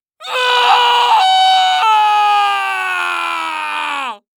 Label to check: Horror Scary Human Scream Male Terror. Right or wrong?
Right